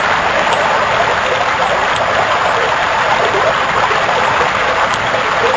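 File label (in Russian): течение шумный близкий приглушенный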